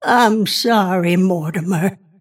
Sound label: death; snapfire